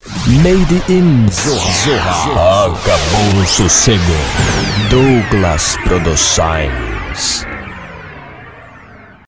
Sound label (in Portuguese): vinheta; douglas producoes